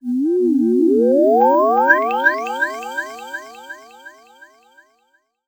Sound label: A Syn, Chords Tones; Equipped Music; Loungin House